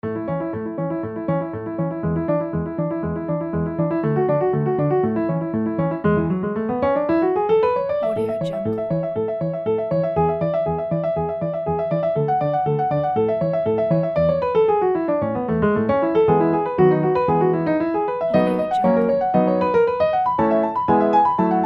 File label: classical uplifting